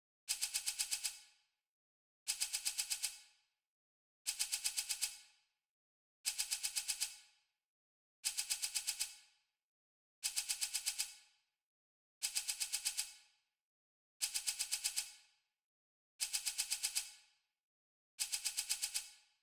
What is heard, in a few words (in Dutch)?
shaker ei